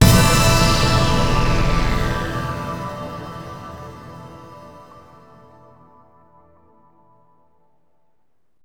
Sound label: Arranged - Gameover; the king of fighters 2003; ps2